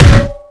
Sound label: fire extinguisher impact